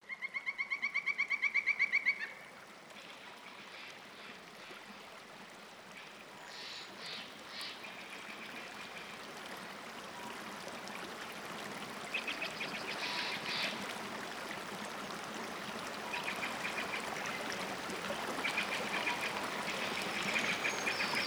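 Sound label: forest stream surround 3D sound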